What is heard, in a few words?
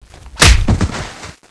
knockout